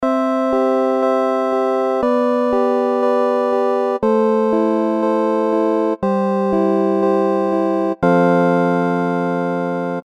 Env Syn Pf

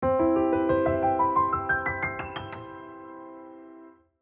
arp CM7

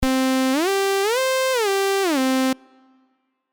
mono port long

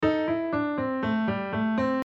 double maj pen

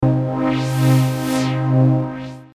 Chord Lead fx

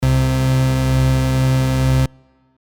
house bass osc

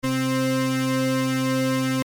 Noise Lead vca

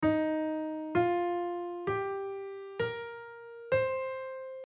Eb major penta

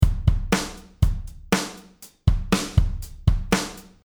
8 beat

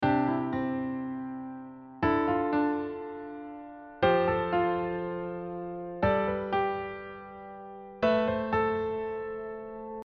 sus2 sus4

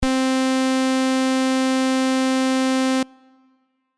trem

